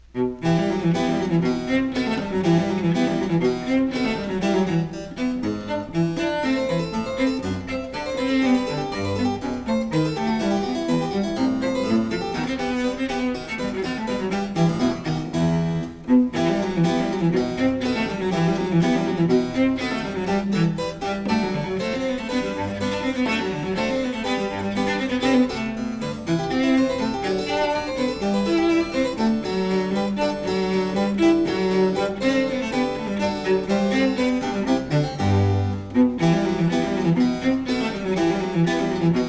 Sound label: Cello Sonata; Vivaldi; Cello Sonata No5 RV40; Janigro